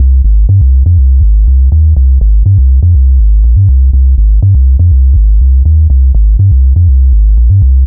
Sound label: Synth Bass